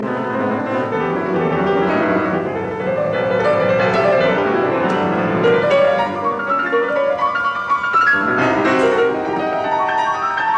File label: Variations in C major on a Waltz; 33 Variations; Op 120; Variation 13; Vivace